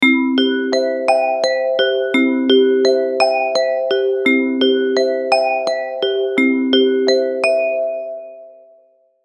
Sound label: Melodic Dew, alarms; Alcatel Pixi 3